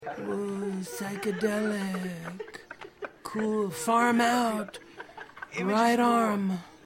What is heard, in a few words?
whoa psychedelic cool